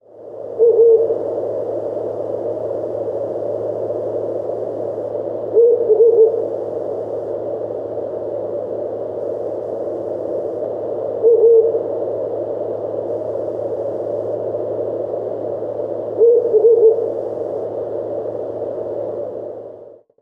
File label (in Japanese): fukurou